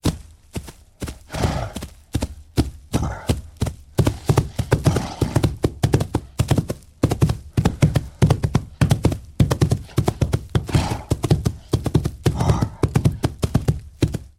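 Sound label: sounds of rhinoceros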